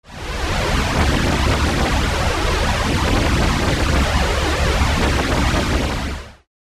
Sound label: Synthex chorus